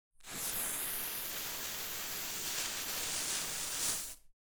ambience room forge working layer

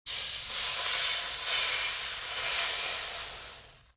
ambience room butchery working layer

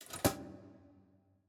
ambience room bucket training

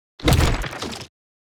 building upgrade build

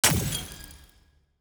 block destruction glass

